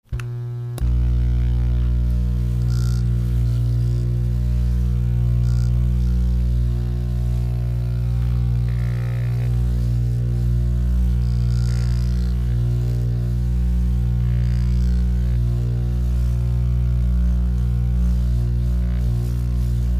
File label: Massager On Run Move, buzz